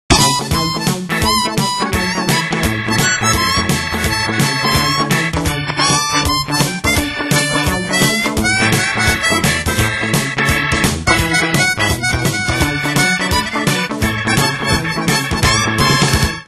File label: Blues Rock; Asus P525